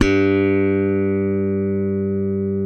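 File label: SBF G1 LONG, SPECTOR BASS; Northstar - New Gold VOL-1; SPEC.FUNKBAS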